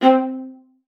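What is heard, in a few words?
C5, viola spiccato; Xsample VOL-5; 90 s Sample CDs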